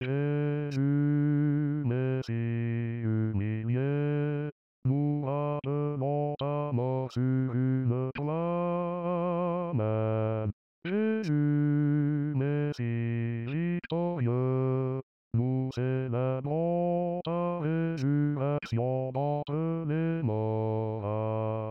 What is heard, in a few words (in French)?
basse